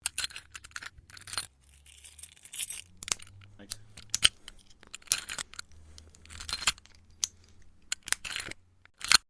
Tumbler Pick Lock

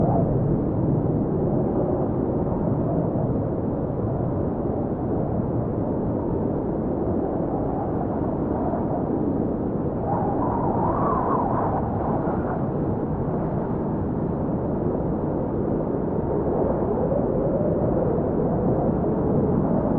desert wind, Ambient

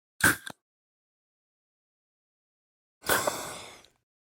inhaler squirt noises

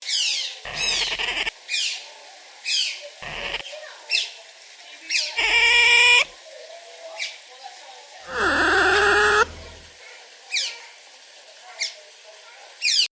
otter whales; Animals

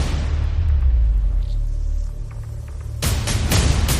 explosion large; SFX

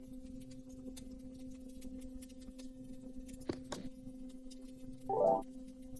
footsteps gravel; Foley

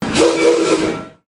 Steam Whistle Puffs

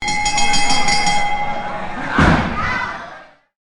Wrestling Bell and Throw; SFX

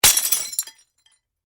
glass break plate; SFX; DailySounds

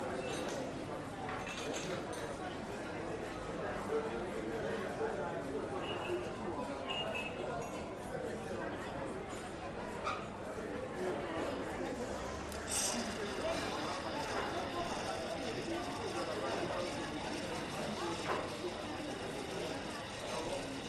night city ambient

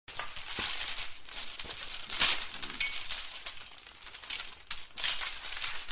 mountain echo; Nature

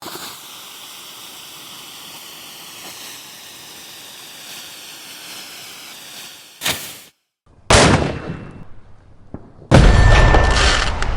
mortar fuse light explode

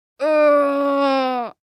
pain moan single shot

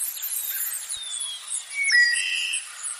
mosquito buzz; Animals

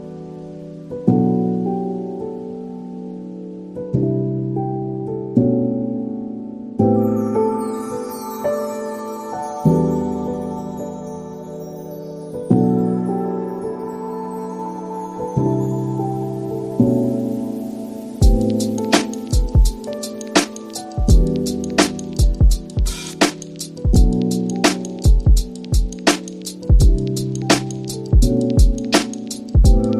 lo-fi hip hop; Music

